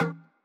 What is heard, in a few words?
Drumloops Beat 128 BPM Solo Perc; One Shots; VEC5 Multi Loops